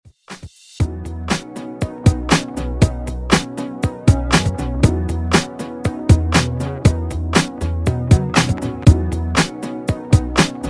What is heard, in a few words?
Top40 Redrum